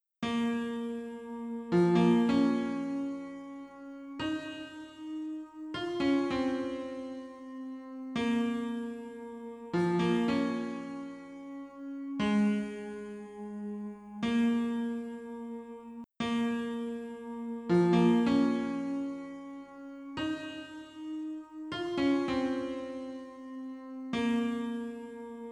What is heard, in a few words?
cutscene music - piano; Torn Tales